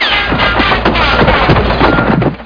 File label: building fall; MacAddict; Stay Tooned Demo